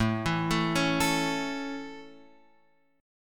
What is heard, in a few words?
A Major A, Guitar Open F; arpeggiated